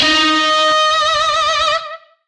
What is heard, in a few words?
Shawzin D Sharp; Oct Three; Music; DE; Warframe; Lizzie Shawzin